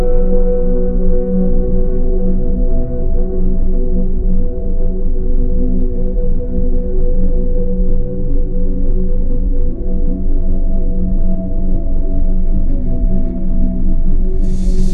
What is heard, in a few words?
dream disco skyline, ringtones